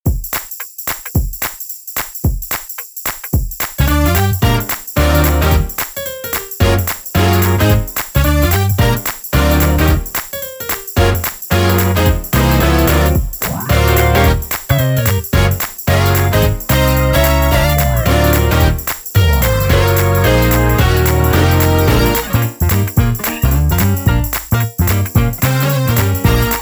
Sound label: Just Clap Your Hands